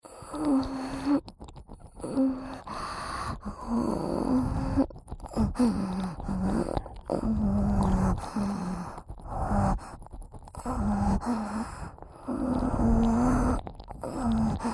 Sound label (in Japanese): Lれろれろ耳舐め＋Rオホ喘ぎ; 耳元オホ声、耳舐めループ; オナサポ